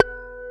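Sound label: GUI Harmonic Flange B3, acoustic guitar; Best Service Gigapack 2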